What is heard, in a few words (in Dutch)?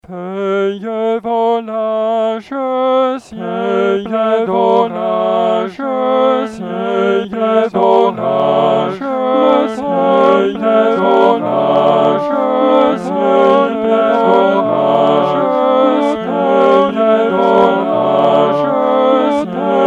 frans lied opname feuilles volages zang vierstemmig